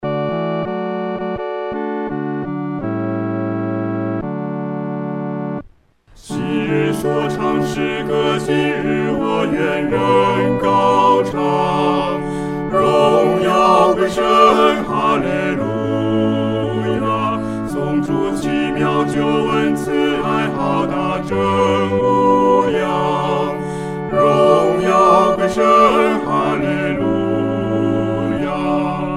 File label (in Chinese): 合唱 四声部; 荣耀归神